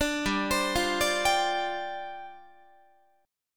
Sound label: G 7th Suspended 4th G down Guitar